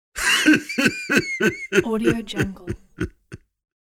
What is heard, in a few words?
cartoon large animal laugh; applehillstudios